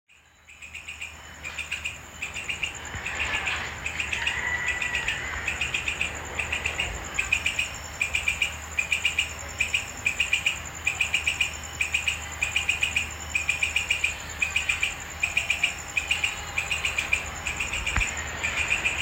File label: grasshopper, sri lanka